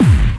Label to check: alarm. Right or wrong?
Wrong